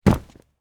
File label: Horror Body Fall On Cement